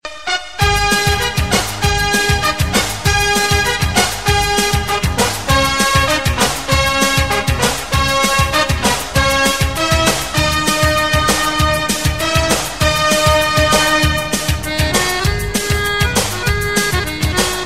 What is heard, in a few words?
ringtone